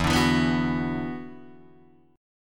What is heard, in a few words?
E 7th Suspended 2nd Guitar DADGAD; strummed